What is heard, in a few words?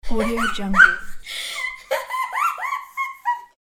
girl laugh